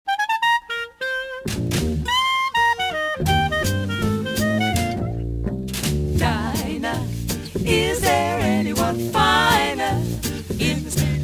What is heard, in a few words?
Medley